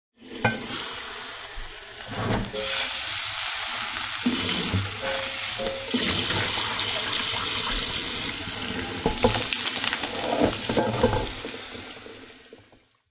Cold water hits hot greasy pan and sizzles righteously; Kitchen; Household